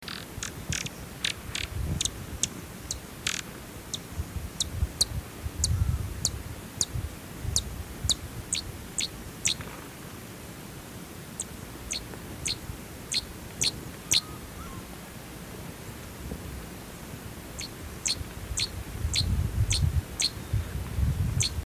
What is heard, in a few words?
sparkling violetear